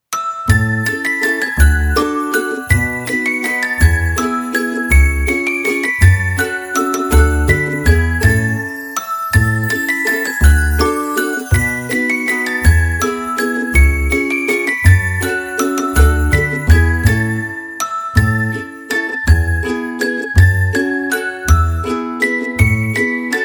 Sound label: christmas fun